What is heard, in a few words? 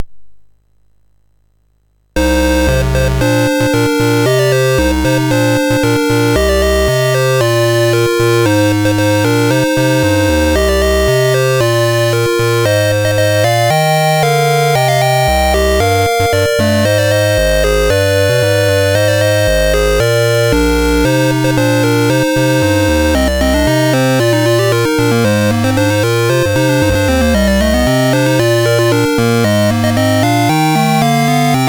Dragonworld sid MOS6581R4